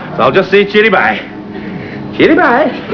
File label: CHEERI; L'Effet Pommier - Volume 04; Télévision; Soap